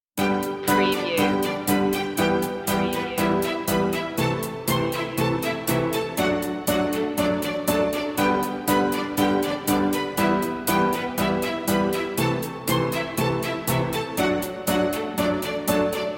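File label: Corporate Motivational, piano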